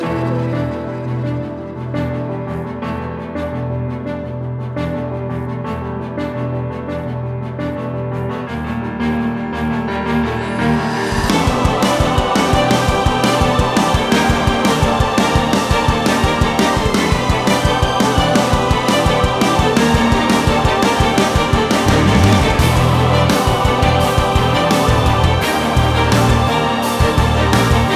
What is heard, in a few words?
BossBattle; Seraph